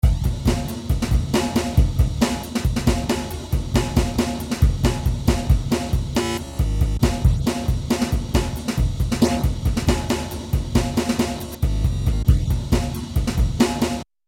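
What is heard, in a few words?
Beats, rhythm phrase; V-Synth; Virtual2Drms; By using VariPhrase, you can change the playback method in m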